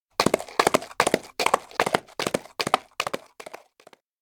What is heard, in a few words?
steps horse escape; anemoi